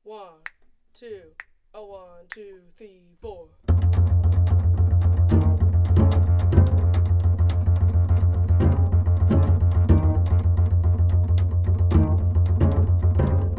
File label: minus melody